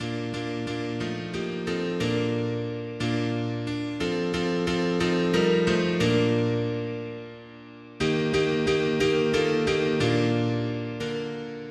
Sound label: mono piano; hymns; Himnario grande